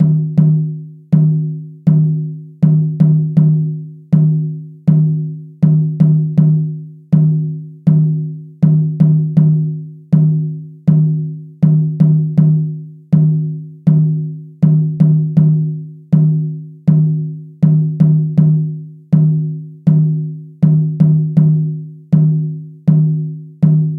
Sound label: Owl Song BGM Drums